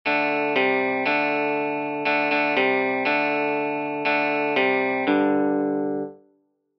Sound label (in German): Power chords uebung